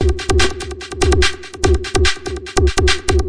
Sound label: 146 electro hacked; REMIXABLE COLLECTIONS; Kraddy Collection